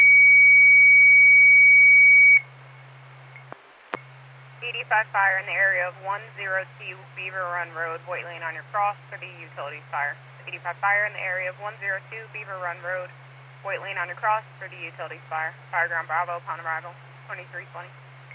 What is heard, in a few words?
Siren; Lafayette FD